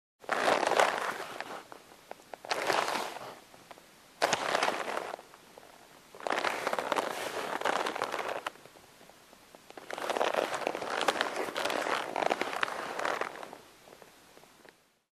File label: Folding a polyester coated cloth